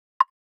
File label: falling nut